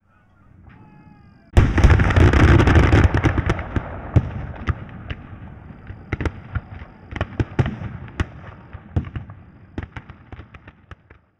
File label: Musket Volley Distant & Fire Command, Period Combat; Sony Pictures Sound Effects Series